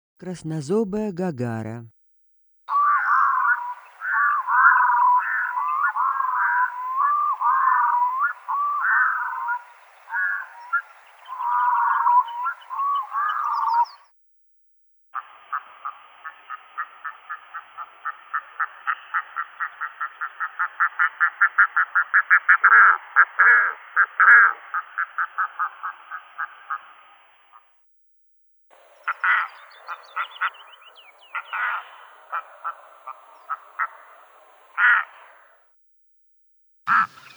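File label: red-throated loon voice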